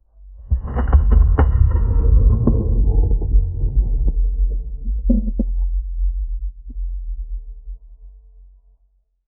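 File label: first person inside a wooden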